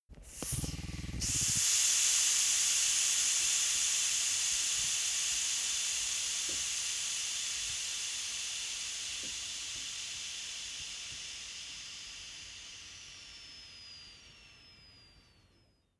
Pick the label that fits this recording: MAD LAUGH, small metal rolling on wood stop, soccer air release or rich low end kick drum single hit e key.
soccer air release